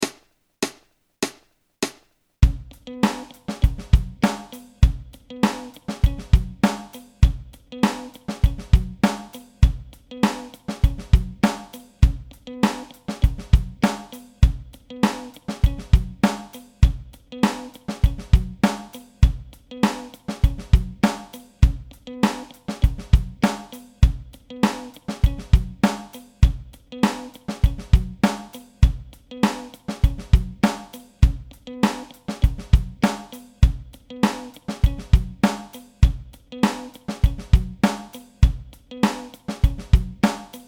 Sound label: fingerstyle funk, bass playalongs